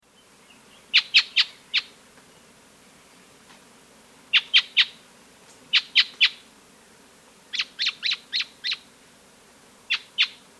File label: Mountain Robin, S. America